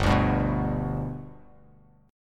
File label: G Major G; G strummed; JGuitar